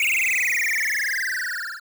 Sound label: Laser Shoot